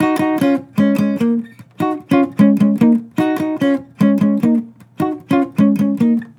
Acoustic Melody Loop - 150 BPM Eb Min; Cymatics

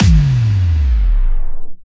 Kick Stop

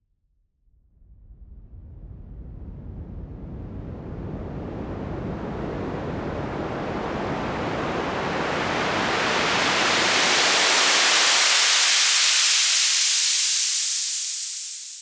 Raise Clean

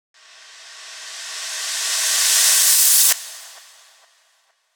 ReCrash; FLPRO

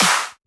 Clap; FLPRO

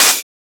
Open Hat; FLPRO

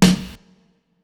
Snare

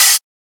Open Hat; FLPRO

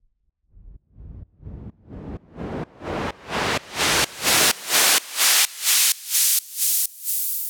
Raise SideChained

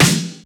Snare; FLPRO; Snares

